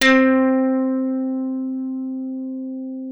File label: C4, slap thumb; Xsample VOL-1